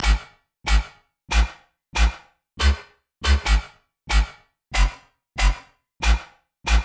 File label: bass